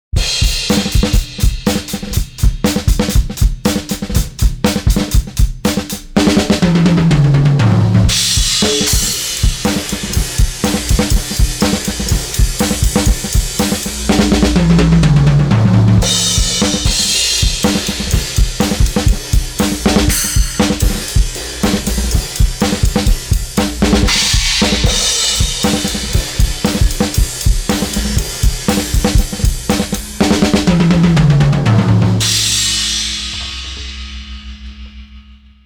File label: EQ HMF boost; Type E; Kirchhoff